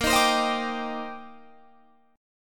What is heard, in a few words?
C Minor 9th Bb Guitar Standard; Cm9/Bb; strummed